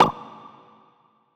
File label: Glitch, One Shots, Glitch FX